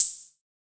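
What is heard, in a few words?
Hi-Hat Open; Yamaha DJX-2B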